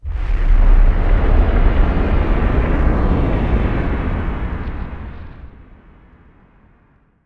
PRAYER WAVE INSANITY

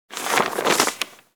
scroll roll

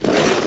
grind

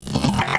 DIE; mahlendur

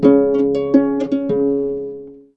LUTE

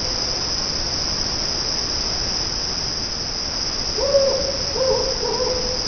small insects and nightingales

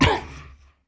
orc ambient short

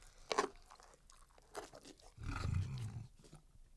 EAT; mahlendur

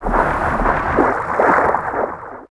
SWIM DROWN

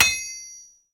anvil hit

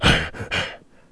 breath

